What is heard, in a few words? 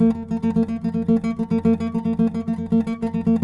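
nylon notes, guitars, flamenco; Spectrasonics; Hans Zimmer Guitars VOL-2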